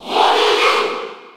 Cheer French; Jigglypuff; SSB4

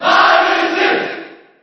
Cheer JP; Marth; SSB4

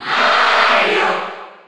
Cheer Spanish; Mario; SSB4

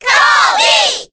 Cheer Korean; Kirby; SSBB

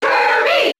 Cheer English; Kirby; SSBB

